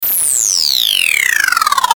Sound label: Descend Static Chopper Sputter; Static Sound Effects; Blastwave